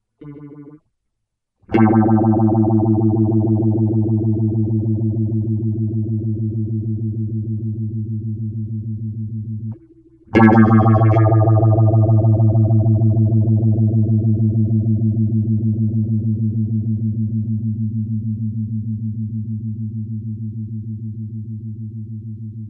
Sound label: GIT Phaser